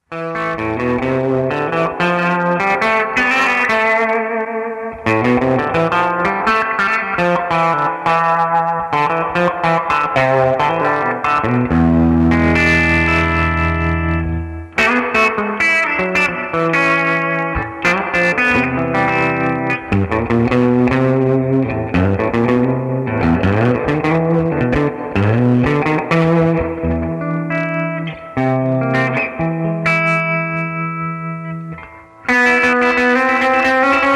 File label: broadcaster